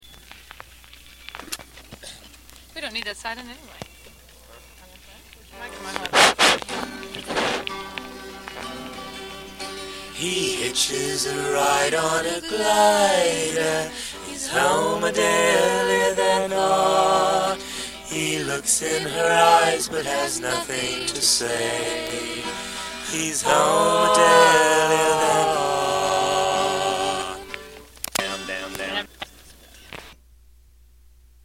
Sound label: Vocal